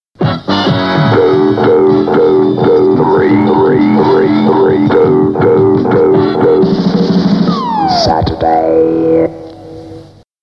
Jingles

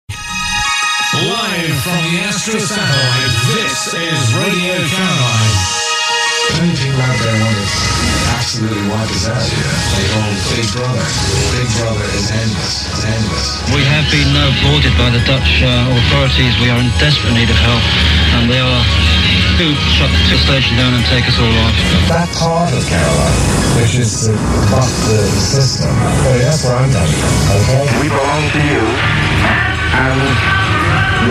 caroline jingle

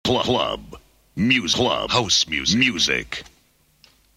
House Music, Jingles; Club Music

